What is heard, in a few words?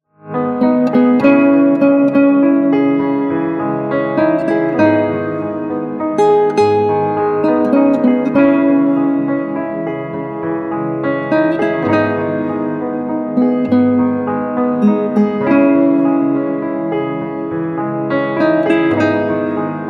guitar